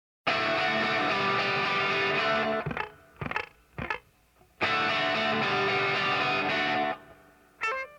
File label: music